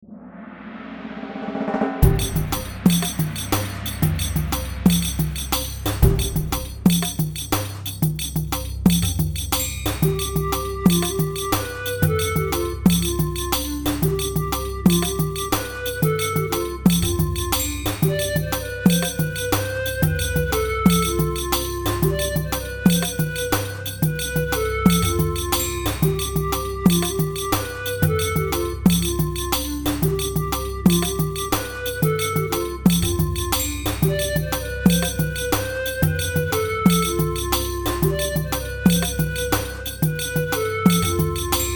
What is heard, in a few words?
Woods Day, RPG; The Frontier - RPG Set